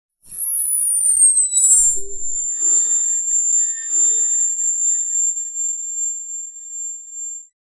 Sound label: firing flare